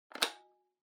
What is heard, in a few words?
Portable Cassette Play